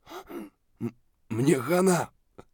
hurt near death; Escape from Tarkov

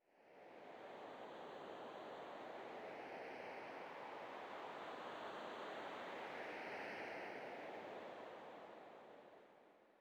sting gentle wind; Rust

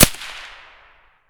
dvl fire silenced close; Escape from Tarkov

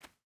footstep barefoot cloth jump start; Rust

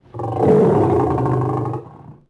Lion Fidgt Vox; Civ4 warlords

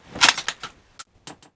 Inf Weapons Foley; Red Orchestra Ostfront 41-45

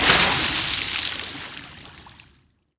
water swing end

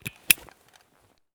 rgd pin; Escape from Tarkov

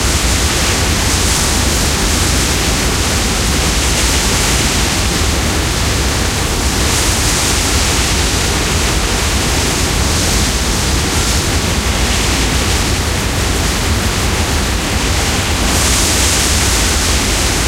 wind open cockpit; War Thunder

